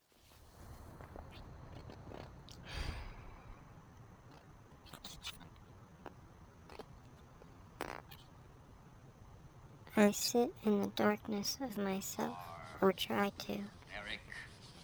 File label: yawp